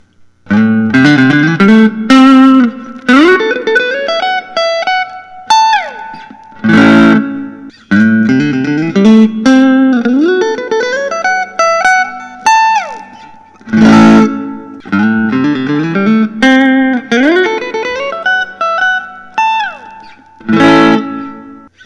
fender custom shop postmodern stratocas

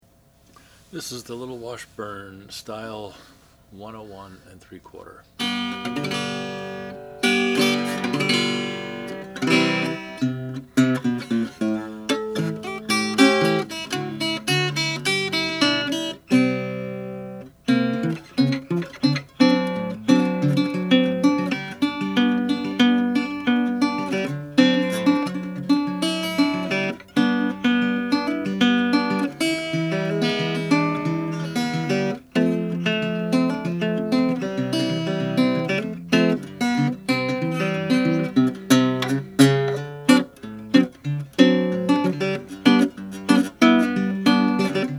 washburn new model style 101 natural

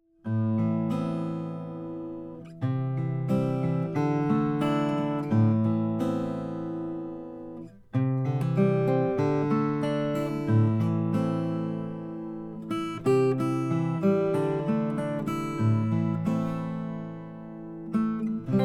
alberico om madagascar rosewood

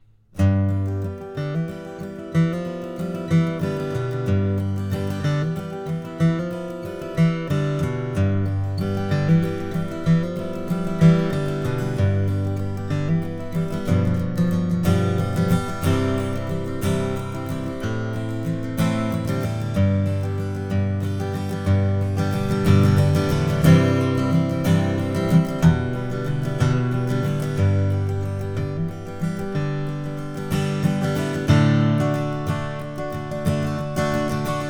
gibson doves in flight custom shop

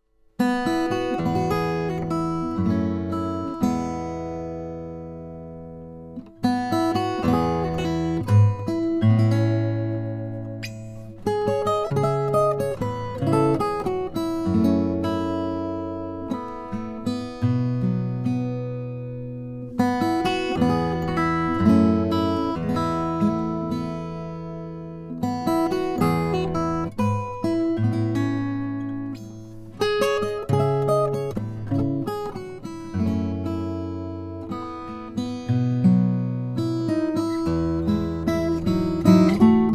Lowden S35MCX fiddleback mahogany

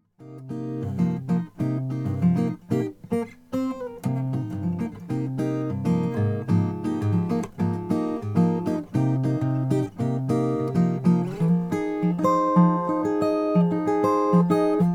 Chasson parlor Adirondack spruce

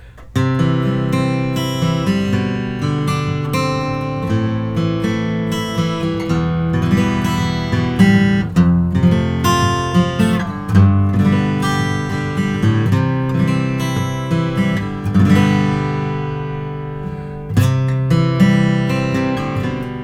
martin custom omc 28m natural

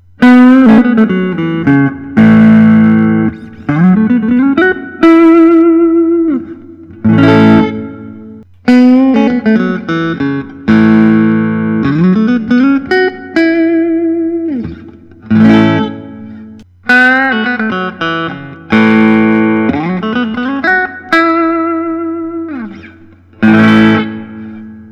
gustavsson fullerblaster korina distressed

